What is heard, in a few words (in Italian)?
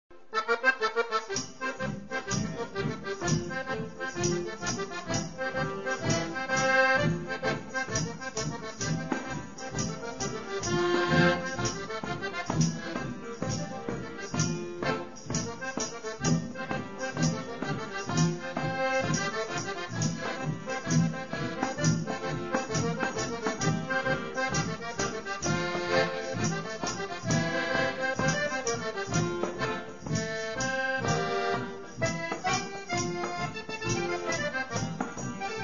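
quadriglia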